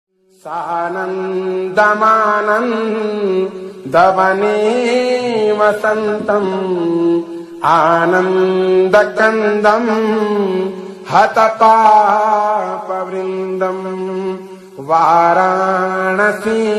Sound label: MALE VERSION RINGTONE